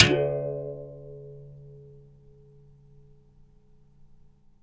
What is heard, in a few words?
BIRIM TO OP, BRAZIL